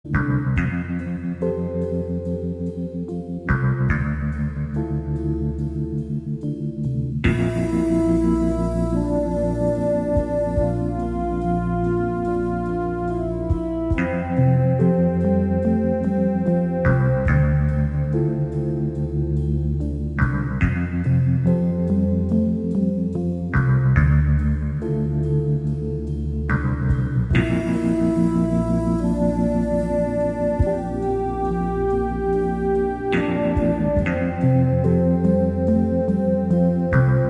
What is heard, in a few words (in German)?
Titelmusik, instrumental; twinpeaks